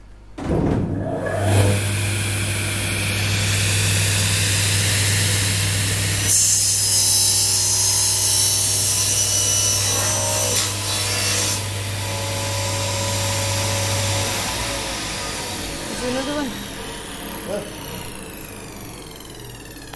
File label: machinery testing a tablesaw